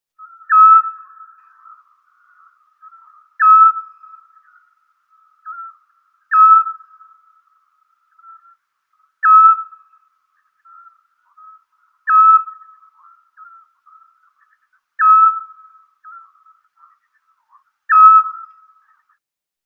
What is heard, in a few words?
splushka otus scops